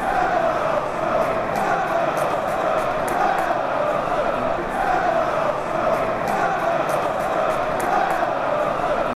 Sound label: Come on Arsenal